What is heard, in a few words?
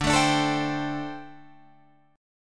D 7th Suspended 4th, down, Guitar, Open F; D7sus4; strummed